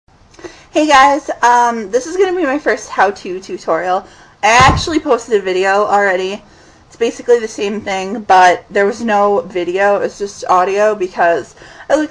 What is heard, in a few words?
exhale and inhale scream